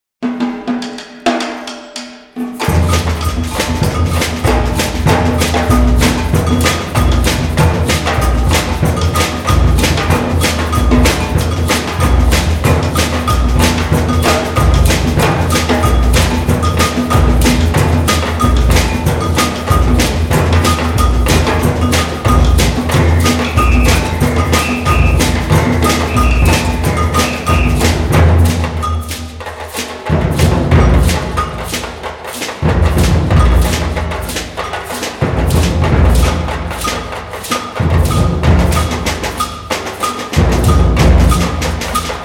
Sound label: Samba Raggae; MIOSM Percussion Concert by WVHS Music